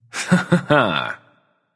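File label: nonverbal heh heh; smite; voicepack; merlin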